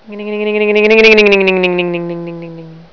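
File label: doppler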